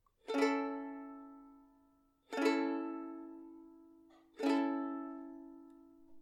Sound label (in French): Ukulele A Accord